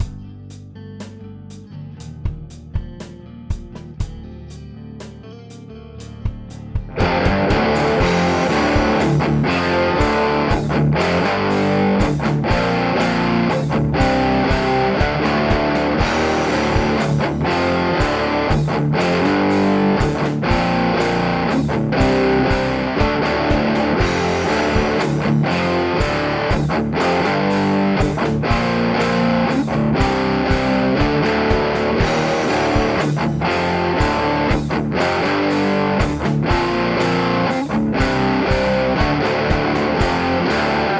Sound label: chorus tone test